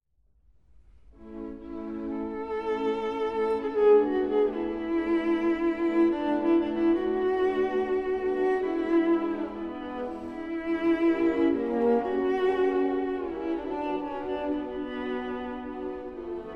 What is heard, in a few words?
String Quartet; Borodin